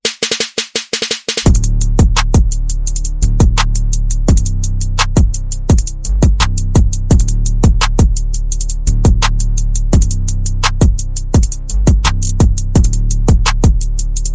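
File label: Bass and Percussion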